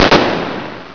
CHAINGUN

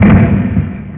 banshee

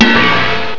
hit

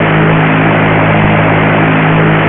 duster

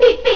BEEPBEEP

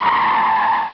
car skid

